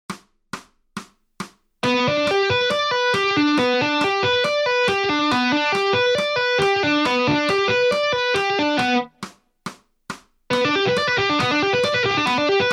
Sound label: Lick